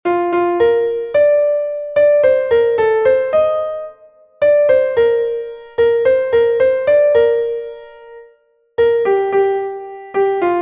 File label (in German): klavier; Frühlingslied; MelodieMeister